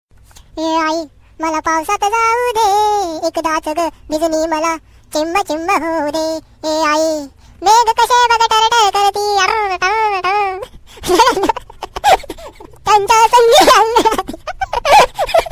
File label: Funny Hindi Ringtone